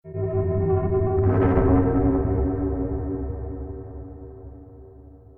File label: Stinger Decrease Action